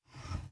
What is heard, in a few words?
slide portrait